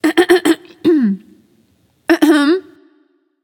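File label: clear throat, female; smite; voicepack; hera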